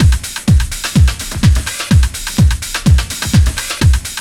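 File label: drum loop; X-Static Goldmine 3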